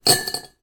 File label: raw dry spaghetti place in glass jar